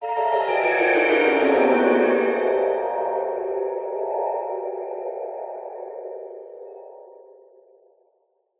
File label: horror descending shrill nightmare; zapsplat